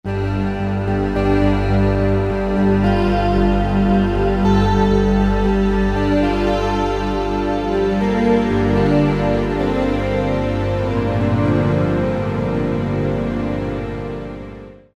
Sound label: Yamaha TG33; SP-Digit